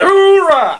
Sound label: Start Game; Swoop 1.0.2; Swoop Sounds